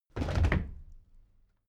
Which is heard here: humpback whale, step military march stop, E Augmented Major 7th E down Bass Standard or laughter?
step military march stop